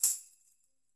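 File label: Tamborim Hit; Latin Percussion; Drums; Drum Hits; Tambourin; Ableton; Factory Packs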